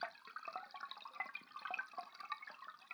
Waterway C Light

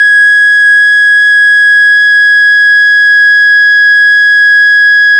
OCARINA G#4

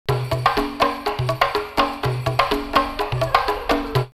Sao Paulo 124, drumloops World & Ethnic; X-Static Goldmine

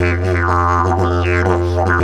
120, Didgeridoo; Didgeridoo and Other Primitive Instruments; 120BPM

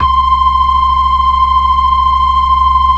organ; Keith Emerson Signature Series

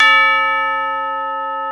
TUBULAR A3; InVision Interactive; Lightware VOL-1

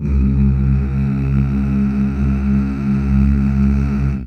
Mmh Male, CH BACK; Best Service Gigapack 1; CH BACK6

